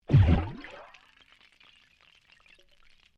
UNDERWATER BUBBLES BURST SMALL, Air released from compressed air cylinder; Nature